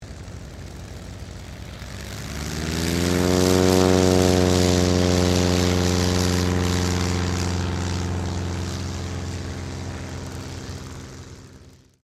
AIRCRAFT CT4A TAKEOFF; CT4 military trainer aircraft; Airplanes

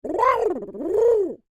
Creature Oodle Bird Speak Phrase Short; Animals; Big Room Sound

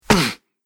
Body Blow Punch Boxer; Punch And Hit Sound Effects; Human Body Sound Effect

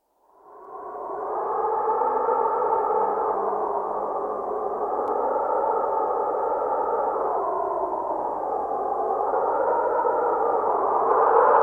A blizzard; Snow; Weather; BBC

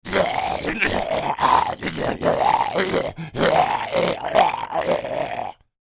Creature Troll Growls Attack; Monsters; Horror; Big Room Sound

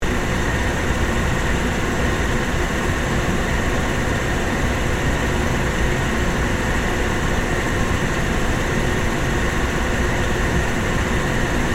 Industrial Fan Motor, Run, Loop; Factories; BlastWave

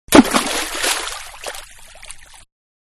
Splash Large Stone Dropped Into Water; Rocks; Materials; Manhattan Production Music

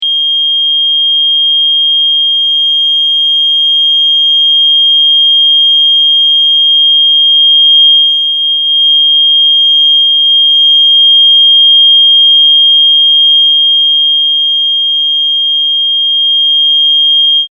Alarm Smoke Detector - constant pitch alarm; Electronics; Tom Hutchings

